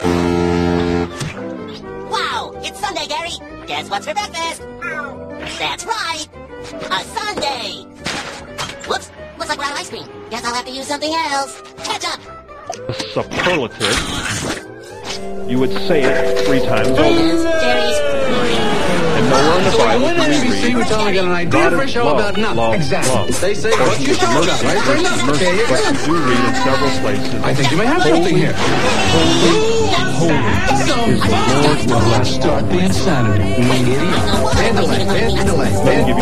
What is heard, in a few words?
noise chapel low